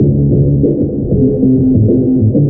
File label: bass; Phonky